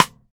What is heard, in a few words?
Stick, Rimshots, Roland BK-5